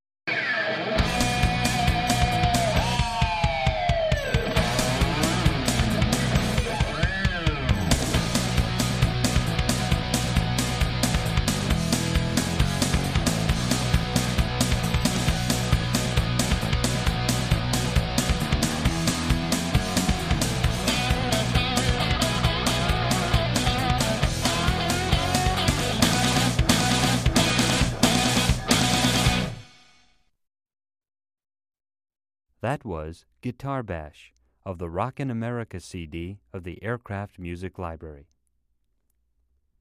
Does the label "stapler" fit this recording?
No